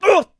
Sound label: shove; video games; source engine; tf